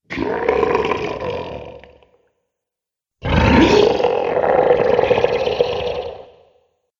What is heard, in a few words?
Dragons, monster; horror